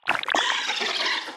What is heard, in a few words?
wriggler warcry; enemies; Factorio